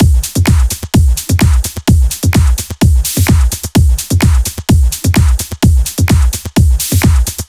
128BPM Simple Kit; VFH1 128BPM The Simple Kit

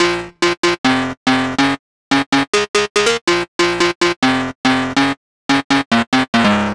Special Sounds Root A# 142 BPM; vengeance essential club sounds-3

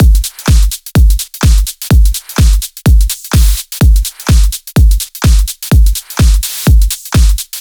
126BPM Kit; Netherworld Kit; Vengeance Samples

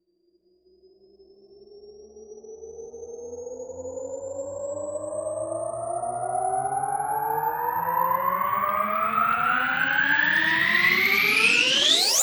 Sweeps Long

